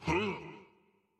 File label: Attack; Dinkubus; Going Under